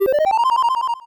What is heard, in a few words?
8bit se